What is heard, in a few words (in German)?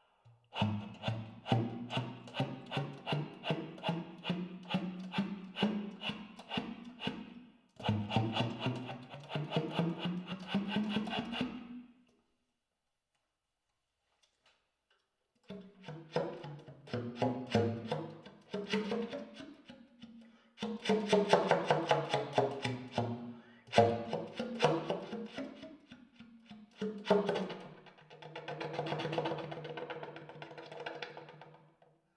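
ten, Tongue Ram, Zuerst mit, dann ohne Mundstück. Tenor; saxophon; spieltechniken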